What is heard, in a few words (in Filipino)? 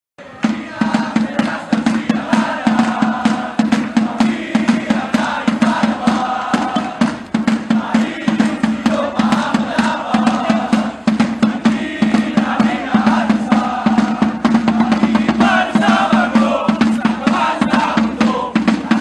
pilipinas perlas ng silangan fanchants